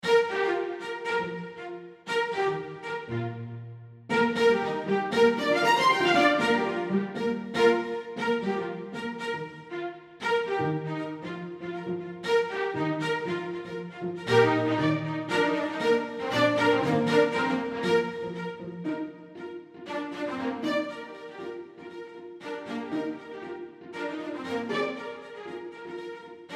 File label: string thing